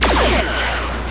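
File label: torpedo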